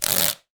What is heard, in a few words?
Wicker Basket, household rhythm samples hits